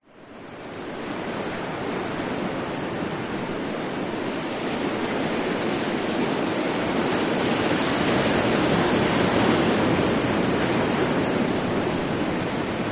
nature ocean waves shoreline rugged constant medium; zapsplat